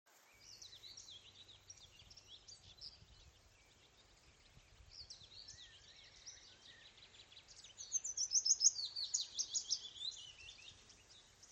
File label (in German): fitis